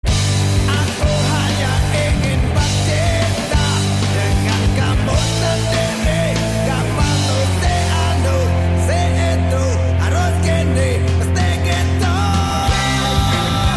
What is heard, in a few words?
Ringtone